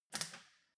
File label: weld sizzle very short